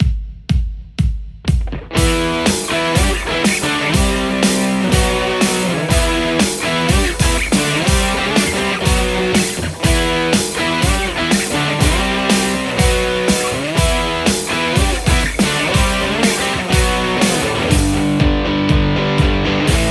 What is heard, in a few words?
strat; igniteamps nrr1 v2 00